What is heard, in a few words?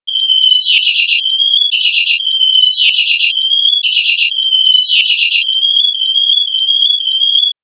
birds